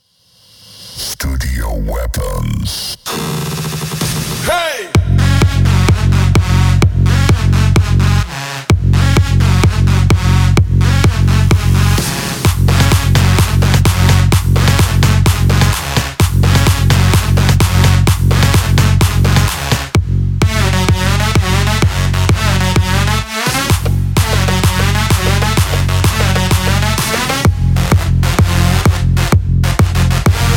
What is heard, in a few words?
EDM Tonal Kicks; Play It Loud: Studio Weapons 5